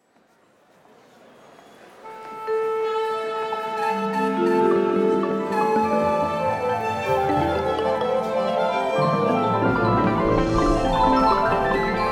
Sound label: Tune Up And Fanfare; Baby Einstein